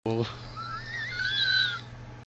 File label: Laugh